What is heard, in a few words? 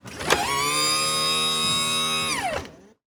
Stretcher Raise Bed; Medical; Blastwave